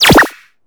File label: COLLECTION SET; SYS CURSOR; maimai